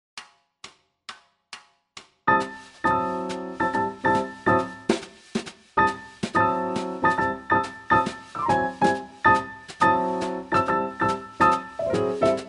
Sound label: minus bass